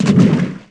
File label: splash